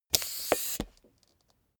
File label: soccer pull out pump